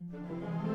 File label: Suspense Start 50bpm; Music Dialogue